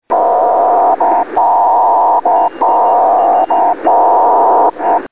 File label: pactor2